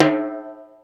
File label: Timbale High, Ensoniq ASR-X Pro